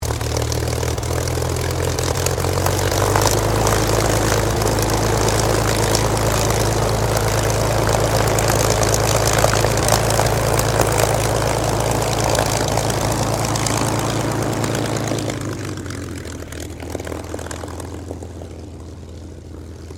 AIRCRAFT SUPERMARINE SPITFIRE MK-XVI IDLE TAXI STEREO; Airplanes; Sound Effects:Vehicles